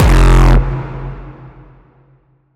cinematic textures and drones

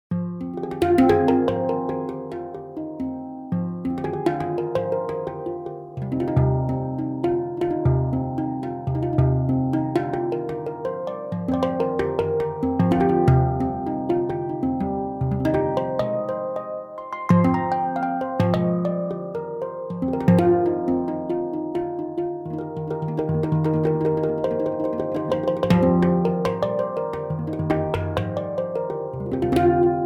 handpan finger solo

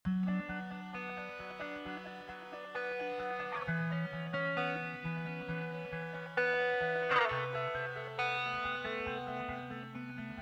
full melody 62bpm

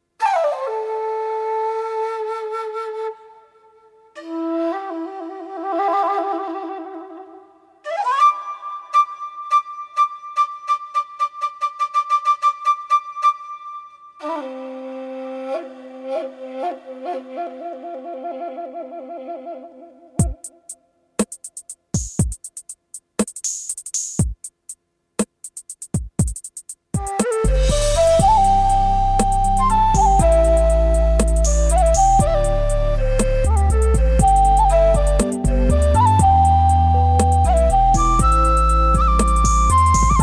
nanxiao

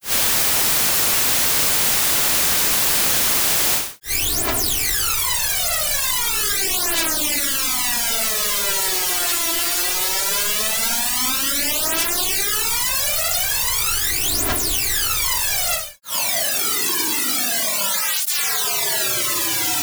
dipole on white noise

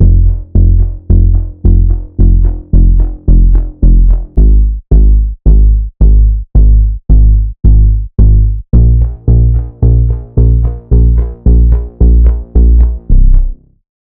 basspluck bass bounce

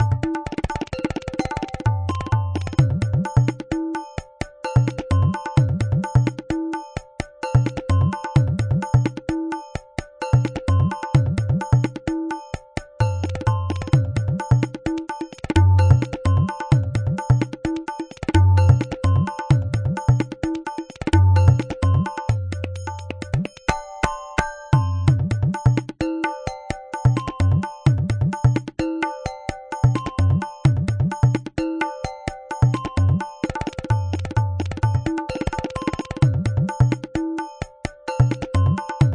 ektal

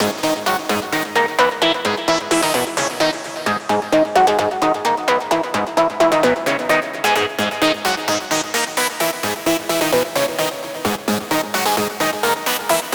melodic enchantment

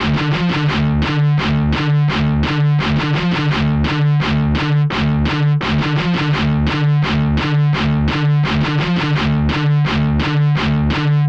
gtr loop D 170 bpm

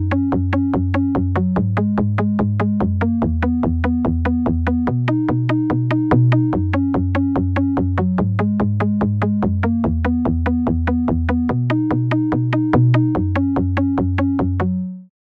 bass, percussive bass

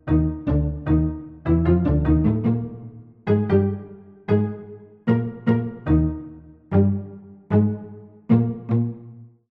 cello pizzicato

